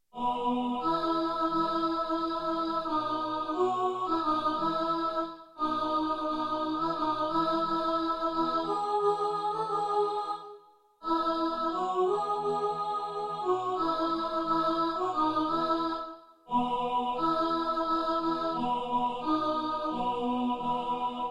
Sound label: gifts alto, choir